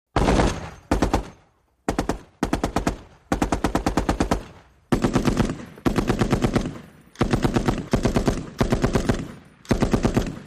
50 Caliber Machine Guns At Firing Range, Various Types, Medium Perspective